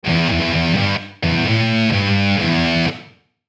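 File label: Musical Element, Drum Snare March; Blastwave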